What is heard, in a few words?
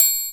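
TRIANGLE; Giga Samples Collection; Orchestra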